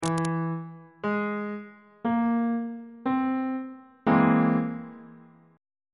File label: d double flat augmented 7th 1st inversion on bass clef